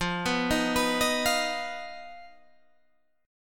D sharp Suspended 2nd Sharp 5th F

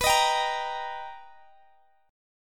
B Augmented Major 7th B down Guitar Open D

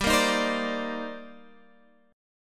C Minor 9th G down Guitar Standard

